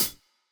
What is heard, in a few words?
pop hihat